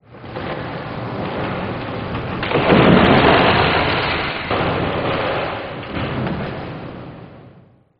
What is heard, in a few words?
Woods - Mysterious Sounds